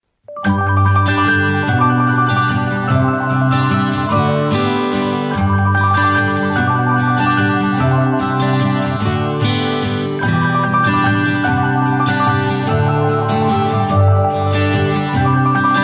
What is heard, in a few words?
east, tecno